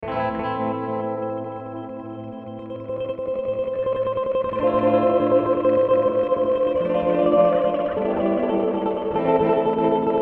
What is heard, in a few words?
Particle Random